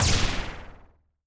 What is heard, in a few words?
laser gun